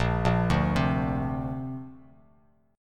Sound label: A sharp Major Add 9th, Bass Standard; arpeggiated